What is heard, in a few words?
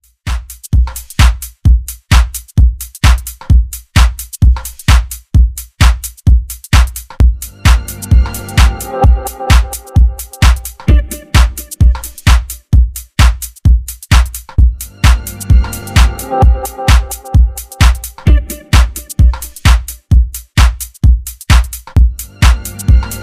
Future Beat